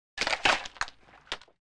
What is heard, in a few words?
Board breaks; wood